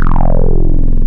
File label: Digital Acid Saw, Wavetables; Synthetic's Serum Collection; ESW Core Wavetables; Echo Sound Works Core Tables